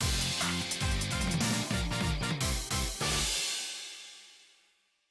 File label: Champion; pro yakyu famista 2020 switch gamerip 2020